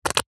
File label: household hair gel pump dispenser push empty; zapsplat